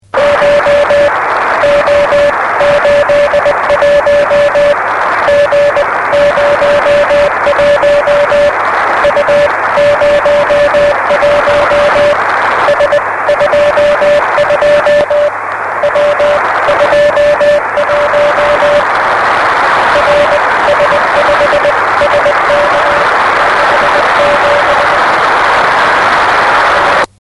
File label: Beacon